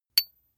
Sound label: small metal impact medium high